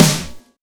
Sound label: Snare; VEC1; Club